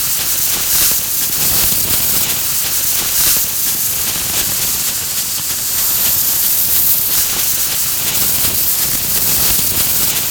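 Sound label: smoke tail loop; gmod; viper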